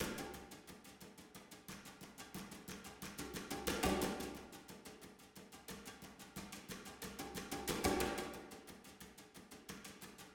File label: Enemy Fight Stem; Icy Plains Enemy Fight 2 Stem 2